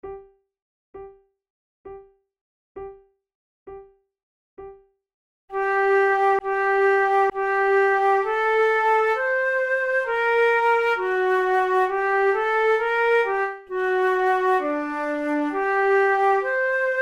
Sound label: sonata adagio, flute